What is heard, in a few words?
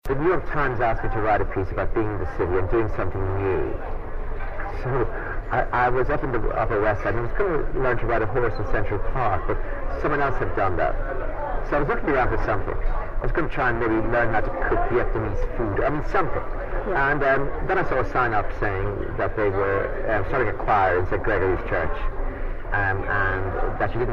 Excerpt Singing; Auckland